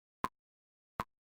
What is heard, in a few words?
hit